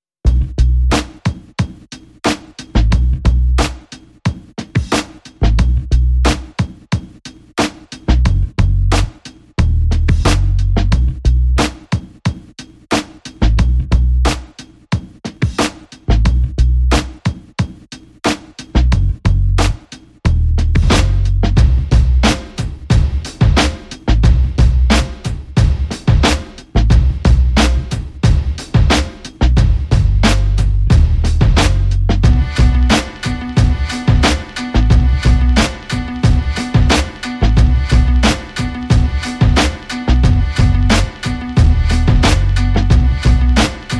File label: Full With Strings